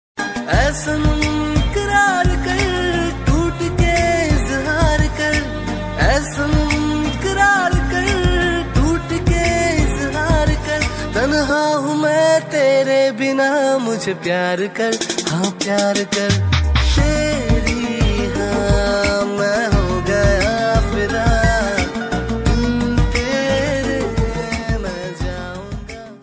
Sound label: Romantic Hindi Song Ringtone